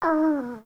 Kitten Snore; Warframe; Catbrow

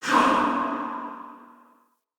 Ghost Soldier Attack, Vocals; Enemy; Wandering Ghost Soldier

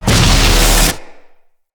Void Tear Close

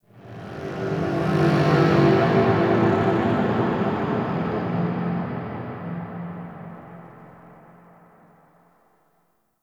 ambient texture; Warframe; The War Within

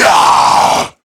Death, Male

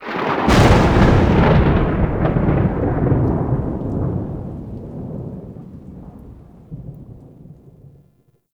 plains thunder clap; Ambience; Warframe; Eidolon Plains

